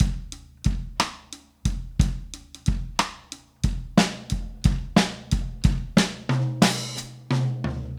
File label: Drums Fill, 70s Analog Funk; Sonic Foundry (Sony Creative Software); What it Is!